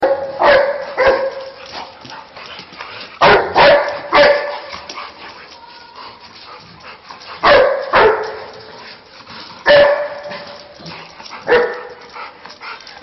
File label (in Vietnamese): Tieng Cho sua Meo